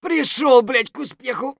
hurt near death; Escape from Tarkov; scav3

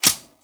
slide shut; Rust

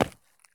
sprint asphalt; Escape from Tarkov

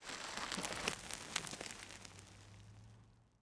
sand debris; World of Tanks Blitz; ambient italy

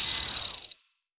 minigun L dry fire end; Weapons; DCS

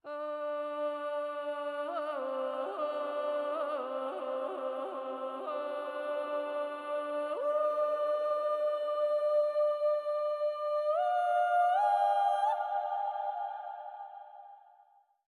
music interactive; World of Tanks

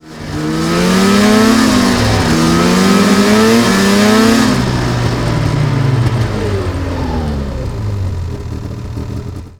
snowmobile icepick fight; cliff; Call of Duty Modern Warfare

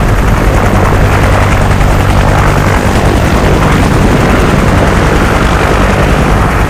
cobra move loop; veh cobra move loop

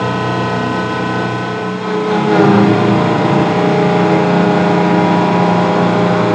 turret; World of Tanks

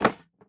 picture frame deploy; Rust

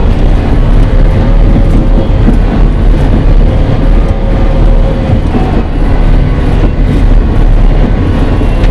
Vehicle Engines; Red Orchestra Ostfront 41-45

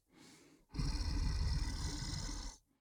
sleeping; Rust

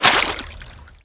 foot water shallow, footsteps

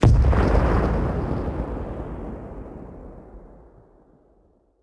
airflak; ambient; World of Tanks Blitz; neptune

